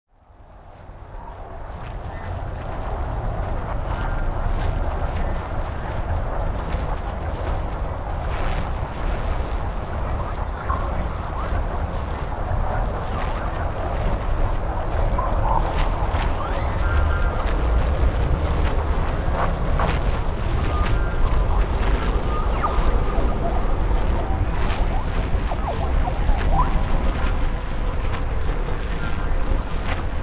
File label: Errormachine